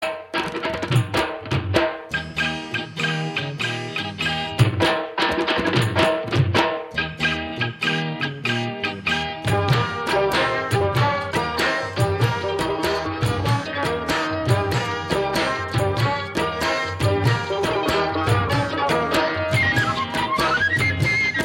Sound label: Instrumental Ringtone